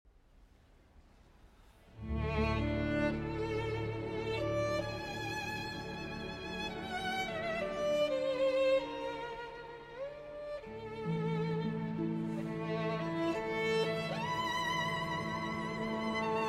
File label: Concerto for Violin and Orchestra in D Major, Moderato Nobile; Op.35 I.